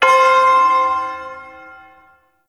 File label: Shawzin Oct Two C; Warframe; Duviri Ersatz Shawzin